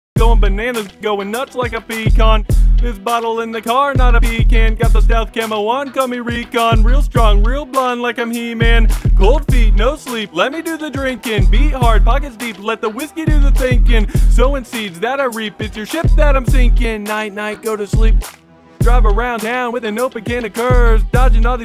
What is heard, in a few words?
vocal raw; vocalforge; whitepeak creative